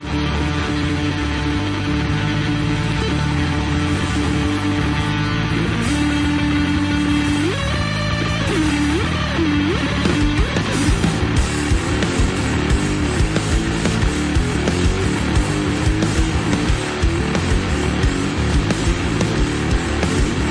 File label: Riff